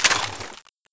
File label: clip out, weapons